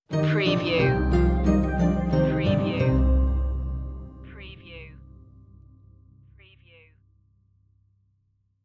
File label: magical fantasy stinger